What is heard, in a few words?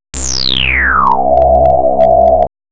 sweep